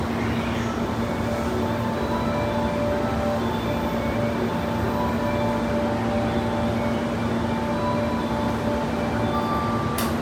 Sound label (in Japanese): 京浜東北線鶯谷北, train sounds